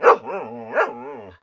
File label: panic, dog